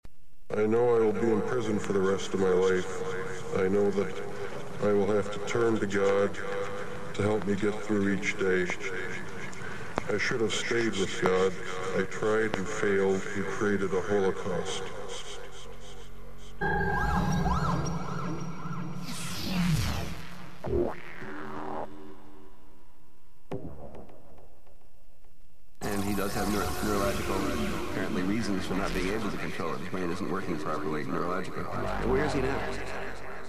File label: brutal hardcore speed gabber